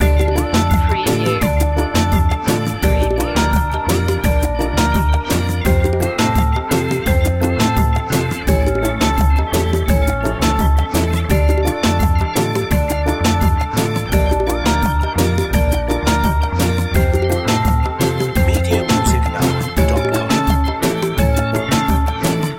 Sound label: latin caribbean reggaeton loop